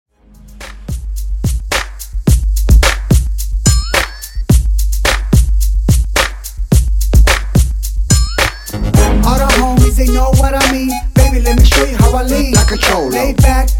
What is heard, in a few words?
extended 108Bpm